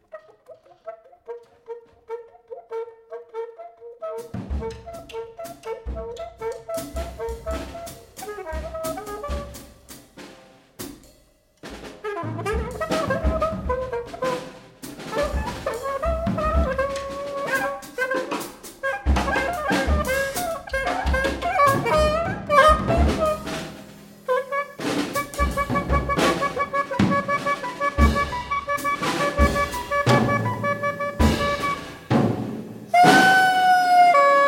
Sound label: Scratch